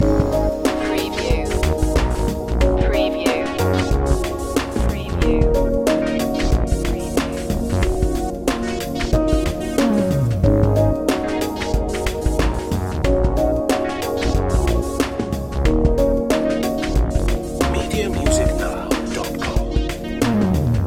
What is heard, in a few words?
urban love loop